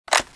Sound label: clip in, weapons; qbb95